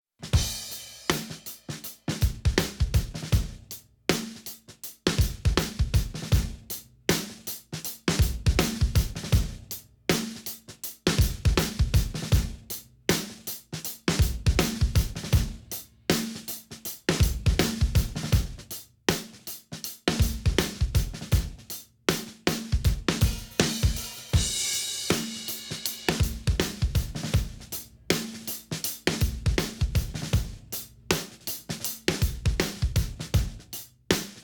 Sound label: Drum Direct Insert Classic Then Cassette